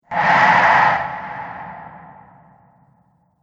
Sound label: Fire Breathing Monster; Monster Sound Effects